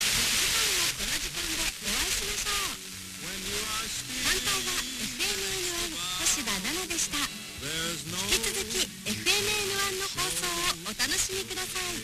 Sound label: 76.3MHz, FM